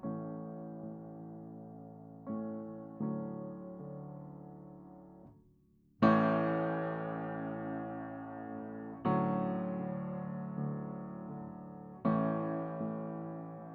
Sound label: Verse Stereo Piano